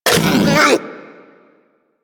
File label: Predasite Vocal Pain; Warframe; Hunting Critters